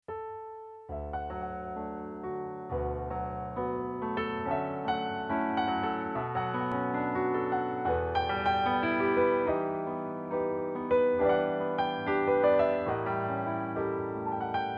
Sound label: PIANO